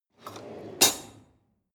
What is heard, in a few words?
drawer cutlery draw out